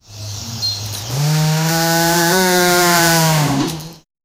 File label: banana flower booming